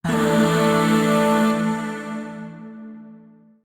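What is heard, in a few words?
Void Shawzin Pentatonic Major Chord; Warframe; Zariman; Chords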